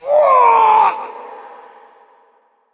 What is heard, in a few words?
Death; Creature; Bolvar Fordragon